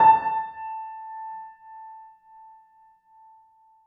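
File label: piano forte a5, Grand Piano; Sonatina Symphonic Orchestra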